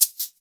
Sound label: SHAKER; InVision Interactive; Percussion Slam